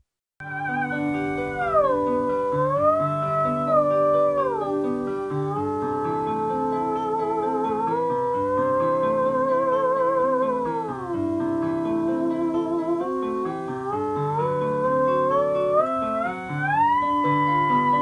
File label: the swan, theremin